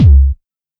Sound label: Quasimidi 309, Drums